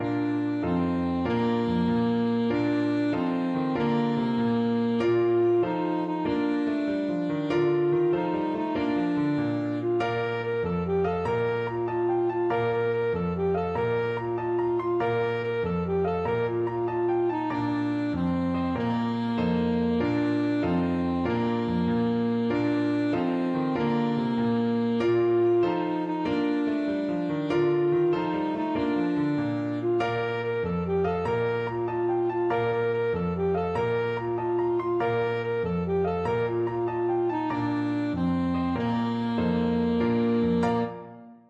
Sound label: three blind mice, saxophone